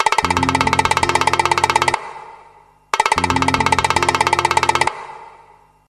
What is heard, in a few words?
Rattle SMS